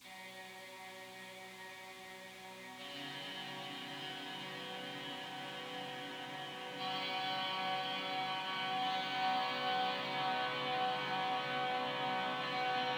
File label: noise noise